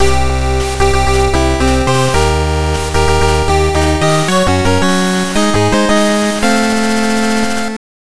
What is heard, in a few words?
Unused Jingle; mega man v rockman world 5 gameboy 1994 gamerip